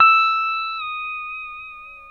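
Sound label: pedal steel bend down E6, Right; PEDSTL BENDS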